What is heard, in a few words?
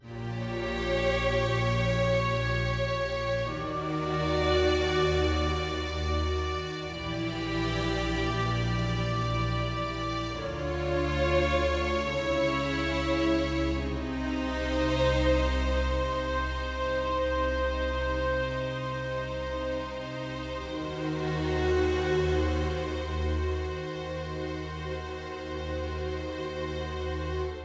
Exploration String